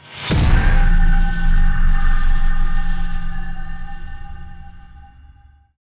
dark flute boost

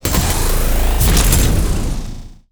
chainsaw extension

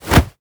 punch

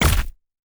slow mag

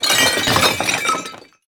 knock over dish

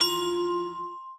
ui card bounce

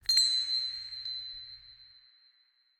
bell

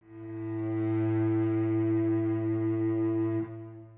cello

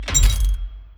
ui alchemy

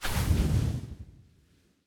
card shining

